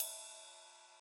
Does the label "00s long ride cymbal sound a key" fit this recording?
Yes